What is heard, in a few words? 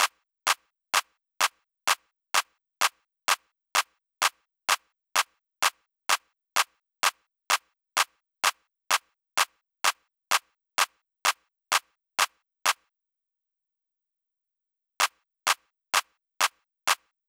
Drum Loop Clap, 128BPM; VEC5 Drumloops; VEC5 Multi Loops 128BPM; Loop Elements